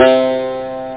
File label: banjo; da capo vol1; instruments; synth